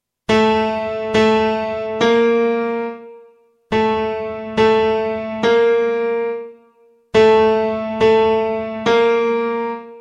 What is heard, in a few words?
piano